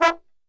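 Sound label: E 2 trombone shot, Brass; Best Service; Brass Super Section; TRMB SHOT; 90 s Sample CDs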